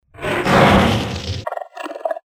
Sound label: roar; trogdor soundboard sfx